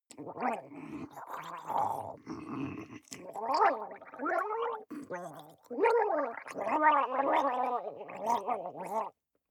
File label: water monster female gargle blabber long; koerpergeraeusche; comedy-cartoon; soundpool